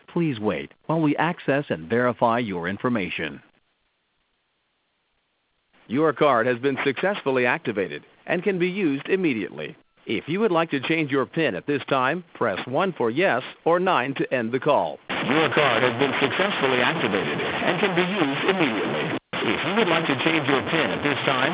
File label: static; card activation